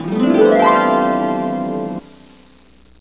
HARP

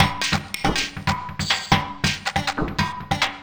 Perc Loop, Dance, Beats

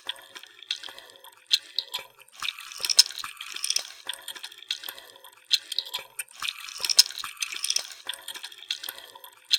Fried Beat

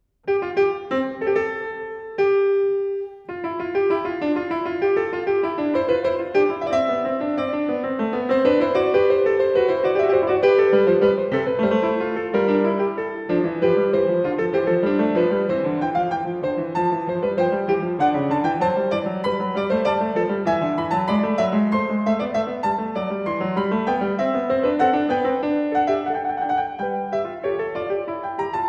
Fugue No. 1 in C Major, BWV 870; Bach; The Well-Tempered Clavier

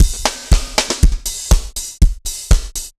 dancefloor tight 120, 120bpm

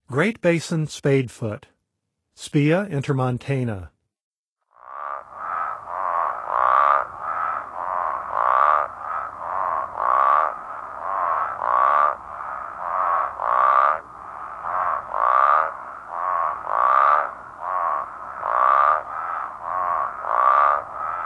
Great Basin Spadefoot; Nature Songs; N. America; Songs of Frogs & Toads of North America

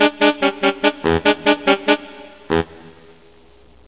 SAX RIFF; MUSIC

